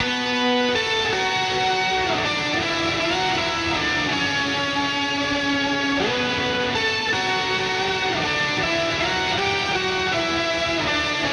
Main Lead Guitar, shoegaze

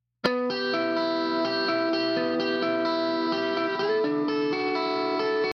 Guitar After Gate

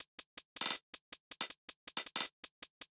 Hats After EQ, hyperpop beat

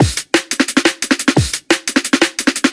Drum Breakbeat Chopped, drum and bass

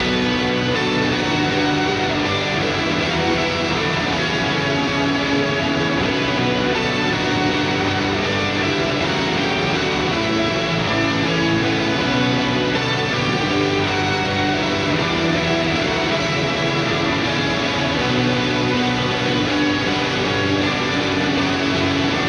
guitars all together; shoegaze

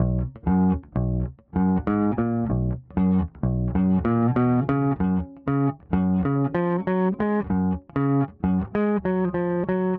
bass guitar; modern hip-hop

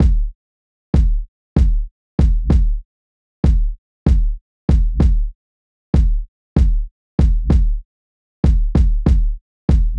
Kicks, modern hip hop

